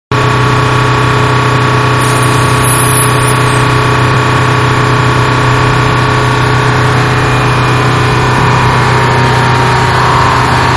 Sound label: Diesel Train Train Pulling Out, Train-Pulling Out Of Station-Outside; Vehicles; Trains; Manhattan Production Music